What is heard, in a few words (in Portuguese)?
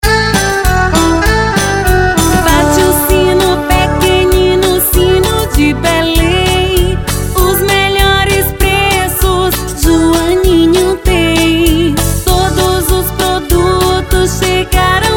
JINGLE DE NATAL